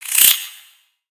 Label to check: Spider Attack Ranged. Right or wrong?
Right